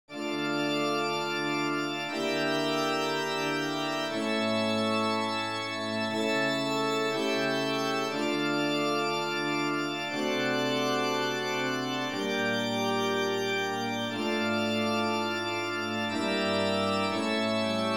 Double in D minor, Simple; Weed

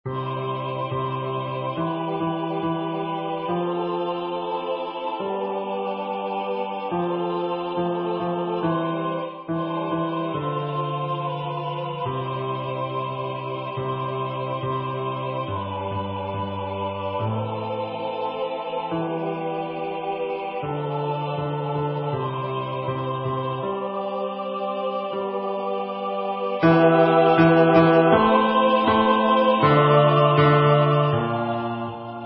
Adoramus Te Christe Bass; Dubois

